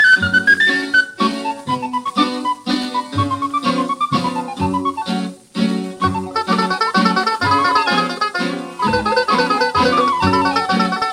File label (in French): Mazurka - Italie